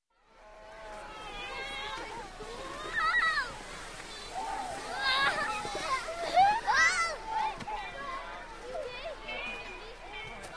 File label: Children Outdoor - Playing On Ice Slide, Ambien; Ambience; Sound Ideas 2000 Series